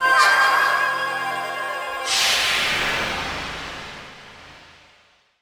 SFX; Merufuru; Wario Land Shake It

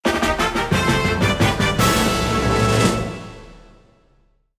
Congratulations!; Kirby's Return to Dream Land Deluxe

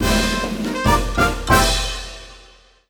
Small Fanfare; Kirby and the Forgotten Land

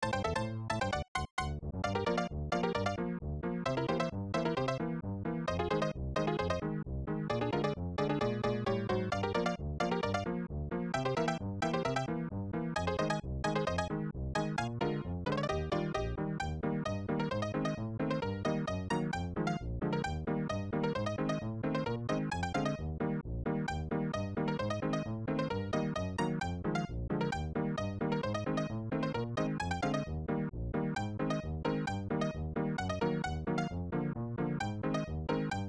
Piano; SMW Remade From Memory 2021

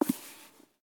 Book pick up; The 39 Steps

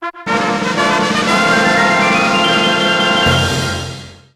Fanfare

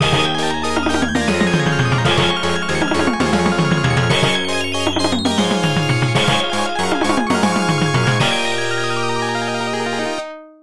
Game Clear; Varth: Operation Thunderstorm; CP System; arcade

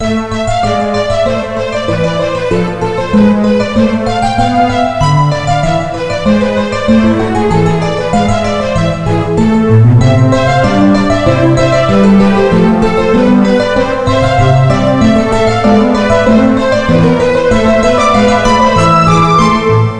BAROQ; Kid Pix Studio Deluxe

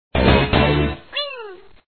win Sound; crazy bomberman bnb bomb and bubbles online 2001

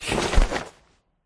Click Tinker

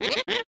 Chuckle; lethal company; ambience; windows

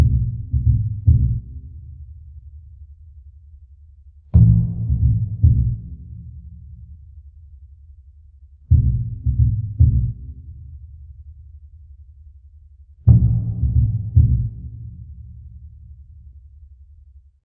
Jungle Level

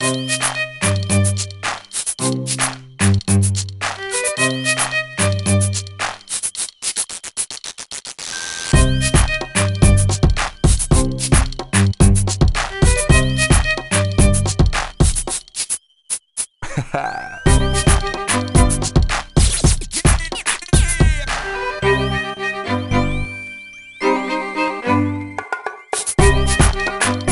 No Vocals; Clique: The Diss and Make Up; NDS

